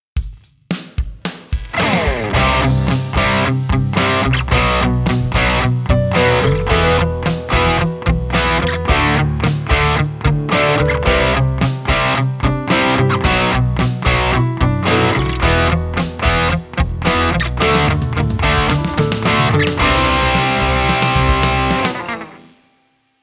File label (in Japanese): ローカット無し, BOSS GX-1